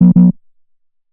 press error

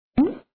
bubble; bubblespinner; html5 games

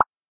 press

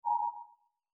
join, chat

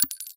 cartridge

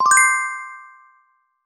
notification

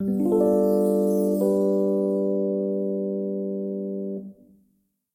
wood reveal

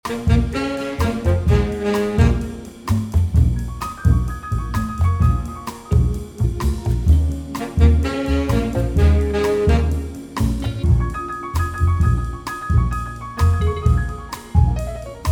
bgm normal

